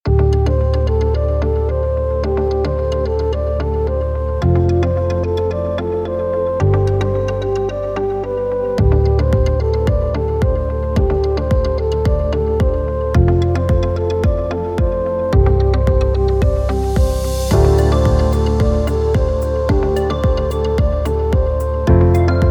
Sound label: Corporate